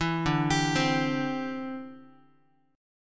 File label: D 7th Suspended 2nd Suspended 4th D, Guitar; D7sus2sus4 arpeggiated